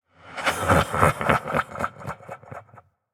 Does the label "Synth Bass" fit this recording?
No